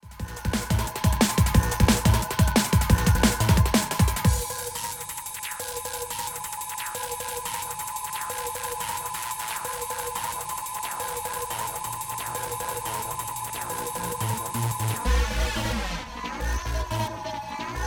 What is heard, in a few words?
flanger